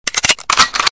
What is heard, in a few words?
Charge Gun